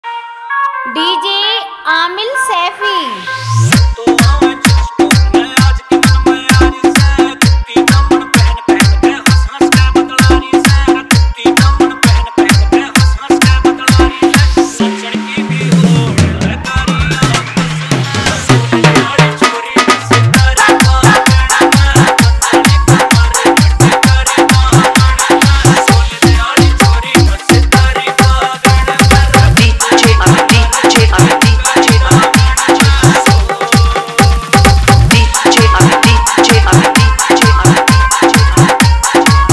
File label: Remix Haryanvi Song